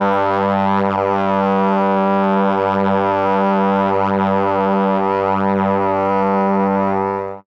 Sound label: brs g1, Brass, MELLOTRON; Retro Sampler; Brass + String